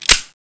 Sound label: l9 slide release